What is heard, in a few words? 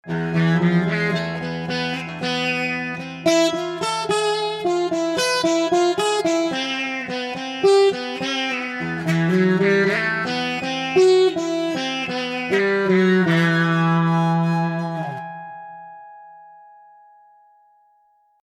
Sitar